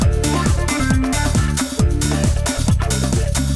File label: estimation music; Buzz! The Sports Quiz; PS2